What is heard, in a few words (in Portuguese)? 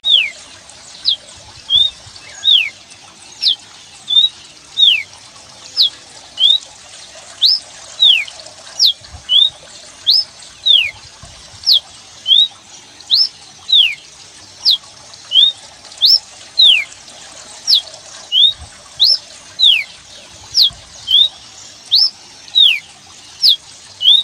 galo campina pantaneiro